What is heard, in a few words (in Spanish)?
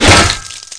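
golpe armadura; Blade of Darkness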